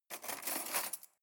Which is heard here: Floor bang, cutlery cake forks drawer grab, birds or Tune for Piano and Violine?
cutlery cake forks drawer grab